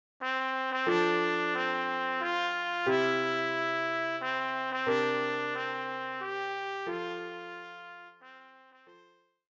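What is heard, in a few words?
trumpet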